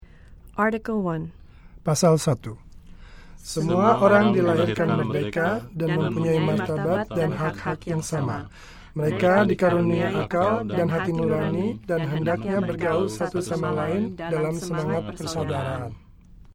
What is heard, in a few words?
Indonesian chorus